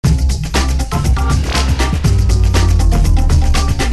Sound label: Disco midi